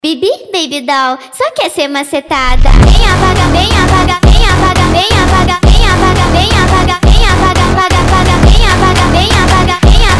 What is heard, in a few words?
funk sped up